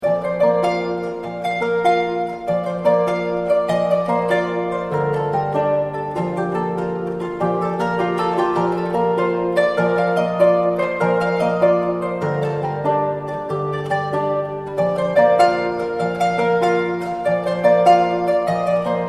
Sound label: gotic, historical harps; gothic